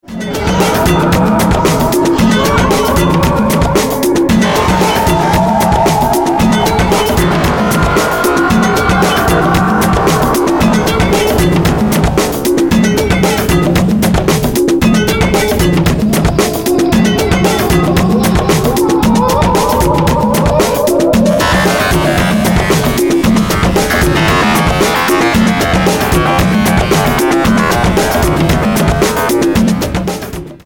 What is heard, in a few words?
Tribal